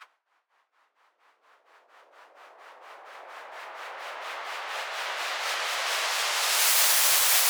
White Noise Up; Cymatics

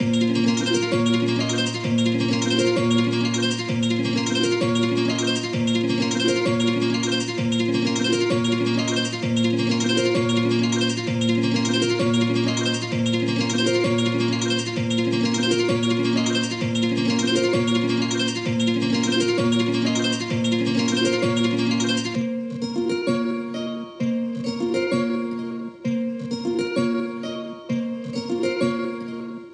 130 BPM A# Min Guitars, melody loop stem; Trap; Loop Stems; Cymatics; 2022 Melody Collection; Matador

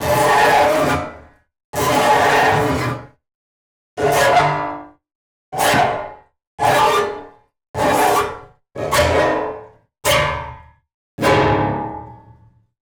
piano FX scrape short; Cartoon - film effects

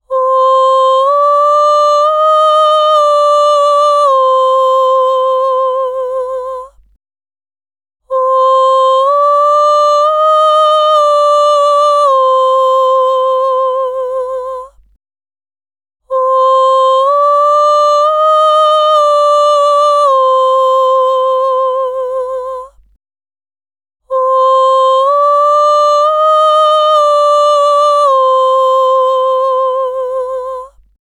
Oh Vocals - Cm 60Bpm; Construction Kits; Ghosthack; SkyRealm; Kit 06 - Before The Storm

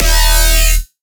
Bass D# Voltage, Dubstep; Bass One Shots; Ghosthack; Dubstep Sound Arsenal

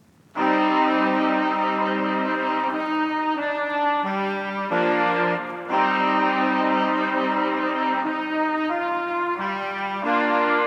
Classic Melody Loop - 90 BPM C# Min Brass; Melodics; Loops; Loop Stems; Cymatics; Eternity

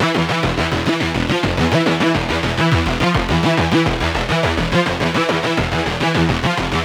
Riddim Melodic Loop - E 140; Intense; Ghosthack; Riddim Essentials Volume 2

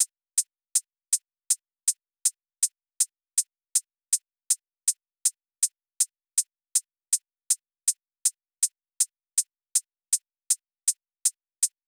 Drum Loop 160 BPM Hihat; Loop Stems; Cymatics; Vibes II Drum Loop Collection; Once Drum Loop

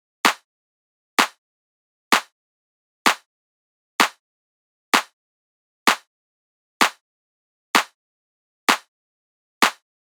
Drum Loop - 128 BPM Clap, house; Loop Stems; Dogs

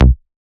Raw Bass C

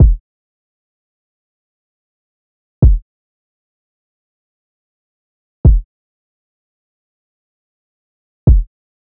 Drum Loop, 85 BPM Kick; Loop Stems; Cymatics; Vibes II Drum Loop Collection; Quilt

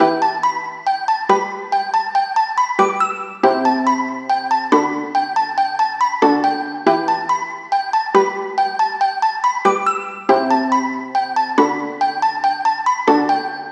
String Loop, 140 BPM E Min; Cymatics; Light Free Sample Pack; Bonus Samples

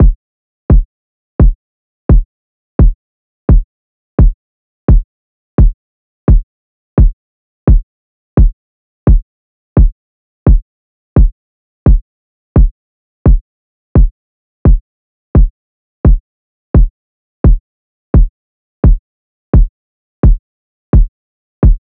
drum loop 86 BPM kick; Loop Stems; Cymatics; DOPE DRUMS VOL. 1; Bunny Drum Loop

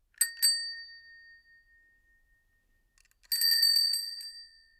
Bike Bells

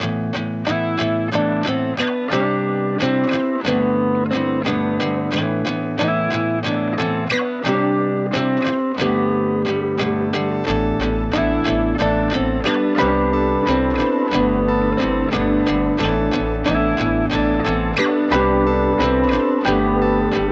90 BPM A Min, Guitar; New Beginnings; Cymatics - Destiny Premium Melodies (BETA); Guitar (Bonus Pack)

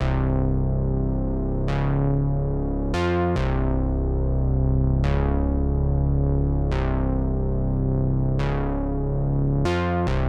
143 BPM C Min Bass; Stems; Cymatics; Cymatics - CHAOS Beta Pack (MIDI + Stems); Sucker Punch

